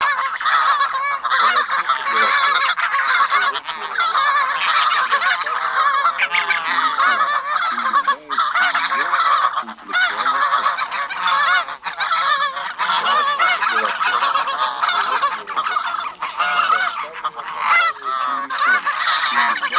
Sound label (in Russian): Гусь белый Oca Delle Nevi; plurifon